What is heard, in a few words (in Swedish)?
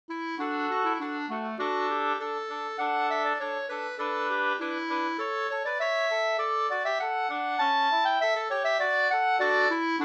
kadrilj; e Pehr Johansson Ekelund Vitaby SvL 2st